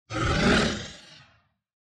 Monster

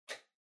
Calculator Far Battery Cover Open

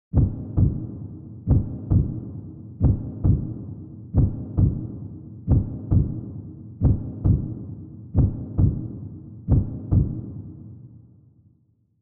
Heartbeat Slow Reverb

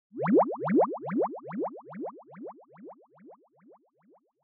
Fantasize Low; シーン・妄想; 低

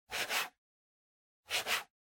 PC Mouse Move Hard Pad Short

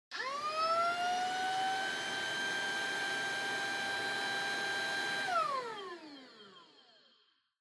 Vacuum Cleaner Vacuum Low Mid

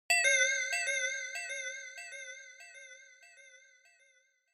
Onoma Inspiration High Delay

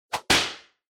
Hit Slap Short

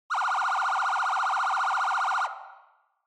Police Car Siren Close

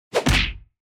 Hit Punch High

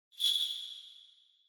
Ring Bells Reverb Shake

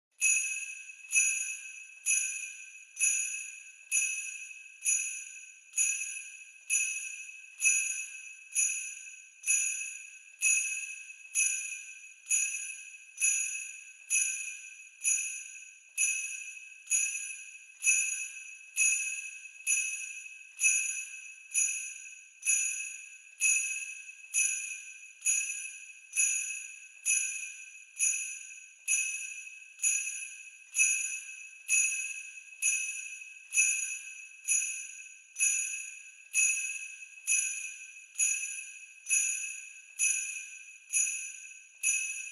Sleigh Bells Knock Hard

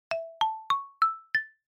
Chime Announce (5 Tone Fast Up)

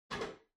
Pendant Light Far Pull

